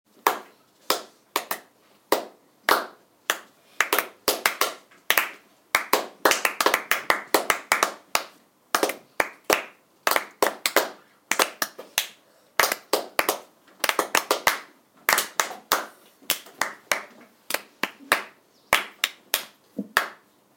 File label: clapping